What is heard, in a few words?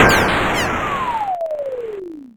Death; habitica; farvoid Theme